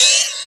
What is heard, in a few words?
RSS, 160 BPM